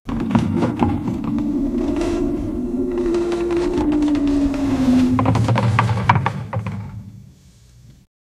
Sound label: the sound of the rope